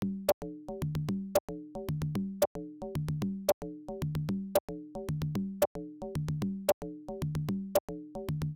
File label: bongos loop; Streets of Rage 1; M8; Genesis